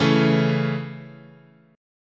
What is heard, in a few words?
Db Minor Sharp 5th, guitar chord